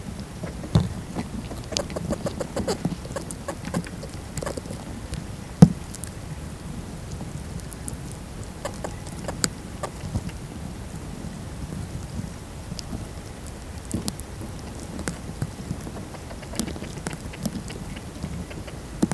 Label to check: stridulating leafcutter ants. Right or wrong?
Right